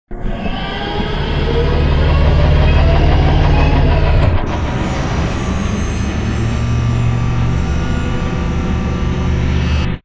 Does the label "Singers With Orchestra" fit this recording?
No